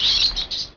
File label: rat